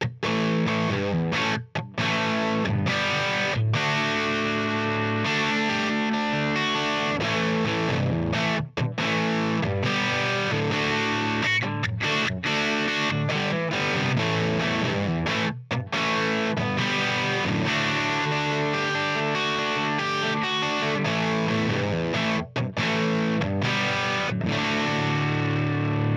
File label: Plexi; trinity-18